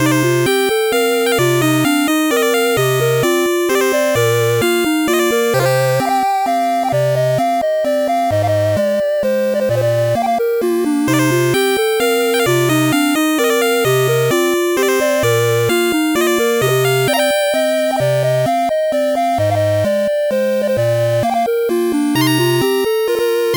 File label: 8bit bgm slow